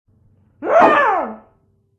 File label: bot human female diver attack voice bottom